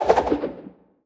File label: death sickle start